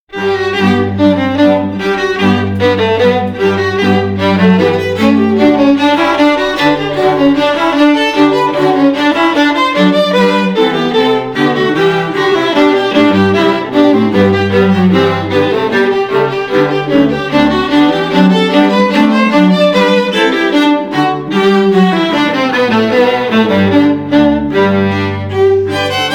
Brandenburg Concerto 3 Allegro Classical, Classical String Quartet